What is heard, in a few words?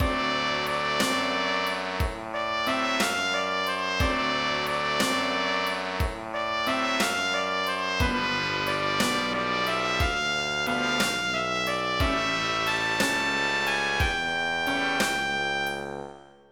blues